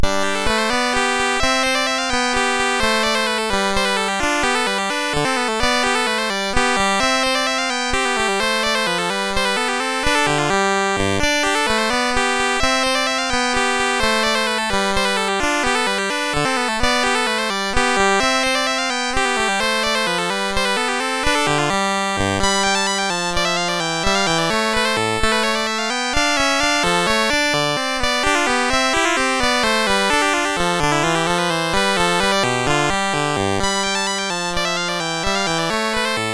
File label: Minuet in G, MOS6581; MOS6581R3